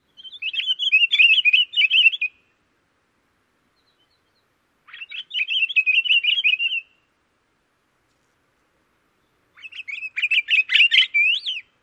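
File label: pine grosbeak song; CA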